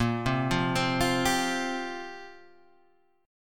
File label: F Suspended 2nd Suspended 4th Bb